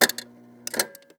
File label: fridge; Sound Design Tools; Raw Foley